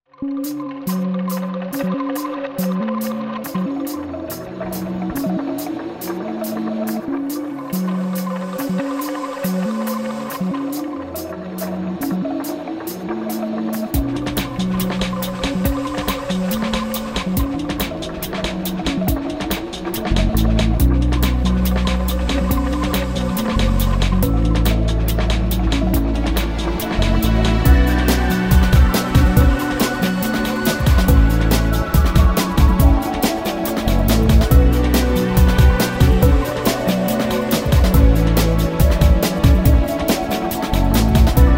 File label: groove squeak